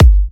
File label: G, Kicks; markus hakala